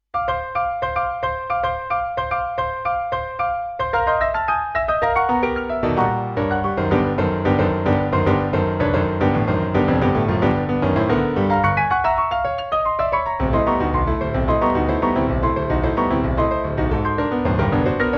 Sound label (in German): klavier; tobenimkarton; Algorithmische Kompositionen